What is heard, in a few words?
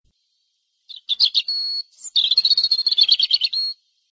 Robin Singing